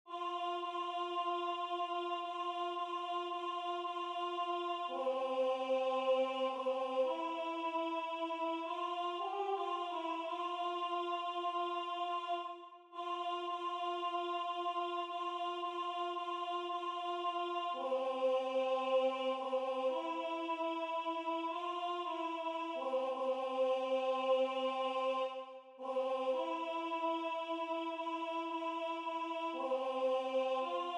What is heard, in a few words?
Alto; DER LINDENBAUM